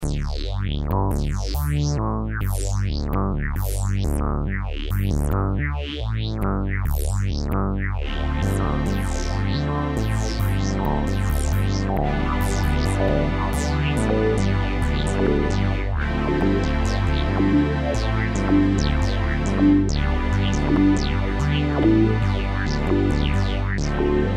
bass and strings

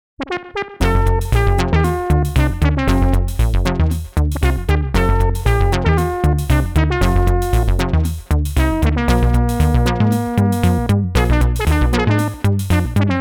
Modular Boogie

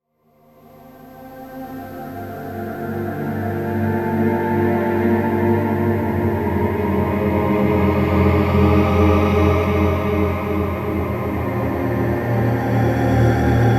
Pad

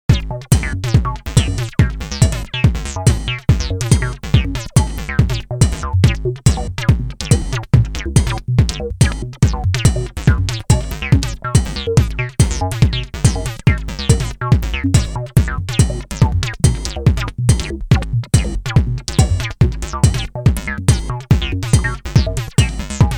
Karplus Acid

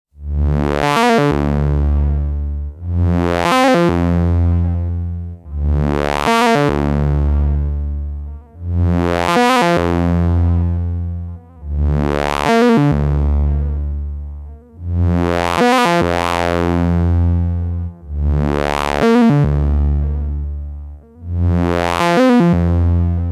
vocal filter

